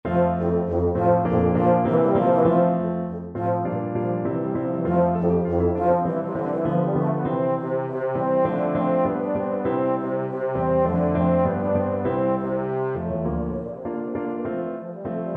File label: EUPH, euphonium